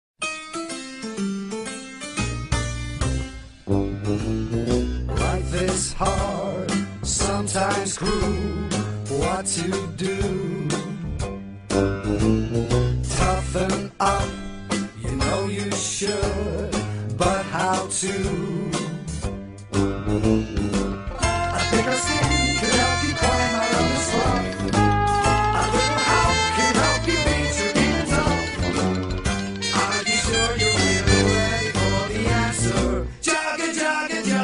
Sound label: Jingle (Alternate Version); Juggernog; Call of Duty Zombies